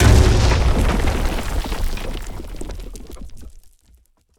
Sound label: rock Explosion; Josh Journey Darkness Totems